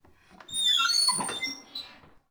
sprung wood door; WAR

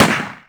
m1 garand; WAR

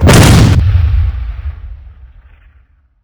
Sherman gun; WAR

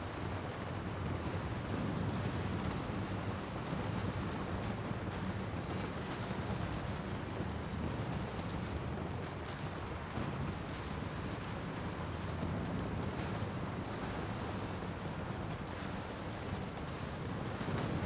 distant battle less river; WAR

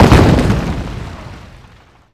medium impact generic; WAR

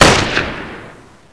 sniper; axis; WAR

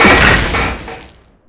stug engine destroy; WAR

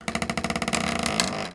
wood creak; WAR

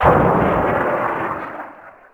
distant shot; WAR

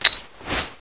throw

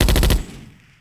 mg-42 burst; WAR

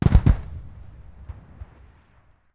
distant gun 60mm; WAR

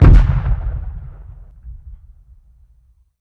distant impact; WAR

